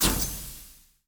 spin Button Up; netent

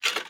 spin button down; netent

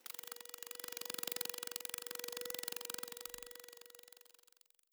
mosquito

